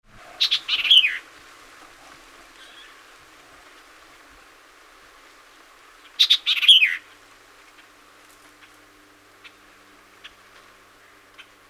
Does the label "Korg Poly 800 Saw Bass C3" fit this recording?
No